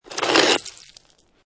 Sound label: Snow And Ice Ice Or Snow Scrape With Debris; Weather; Lynne Music